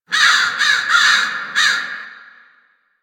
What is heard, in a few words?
Fall Crows; Warframe; Ambience; Nineteen Ninety Nine; DE